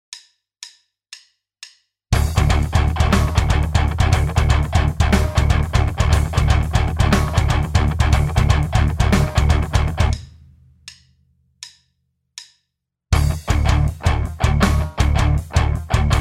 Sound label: rhythm